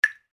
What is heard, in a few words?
notification water drop